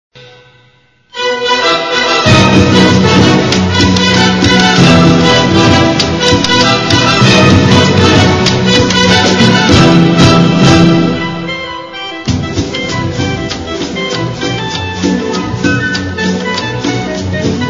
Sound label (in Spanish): cumbia; bailes musica